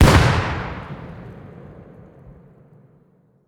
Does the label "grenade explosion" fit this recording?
Yes